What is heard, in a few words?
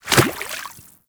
Small Walk Water, Footsteps; Enemies; Warframe